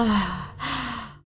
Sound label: Moan